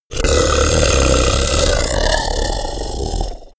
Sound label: beast grunt, comedy cartoon; kreaturen; soundpool